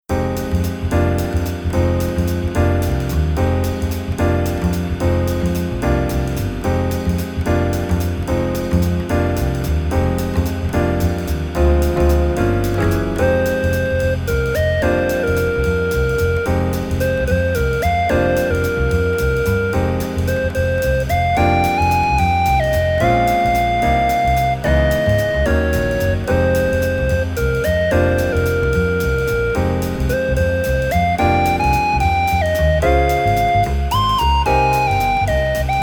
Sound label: Jazz Waltz Sketch All